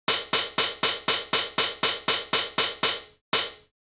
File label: drum hihat, pulse train sound